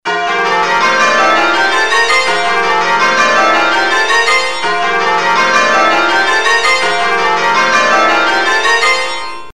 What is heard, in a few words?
Back Rounds, changeringing